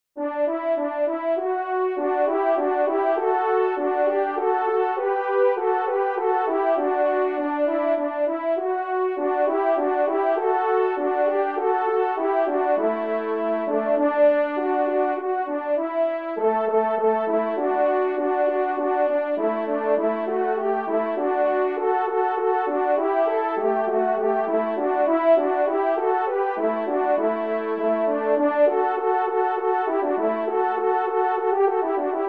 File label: Chaconne comique Do Maj; Corrette